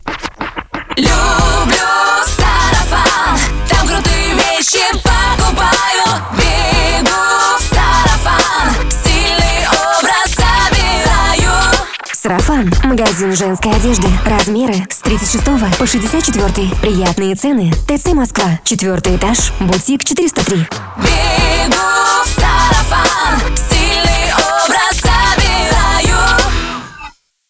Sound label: vocal; sarafan